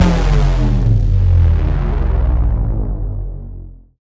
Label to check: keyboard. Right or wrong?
Wrong